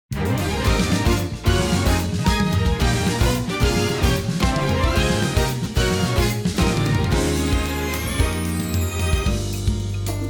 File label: inst